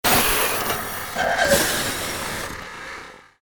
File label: hydraulic door